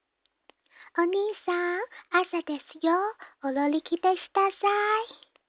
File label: alarm